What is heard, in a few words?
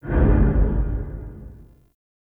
Low Mech; Warframe; Ambience; Nokko Colony